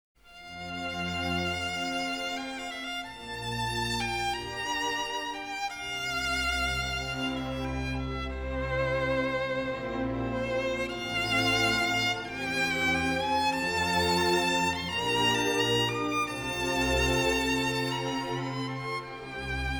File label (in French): romance violon